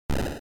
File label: General Noise Single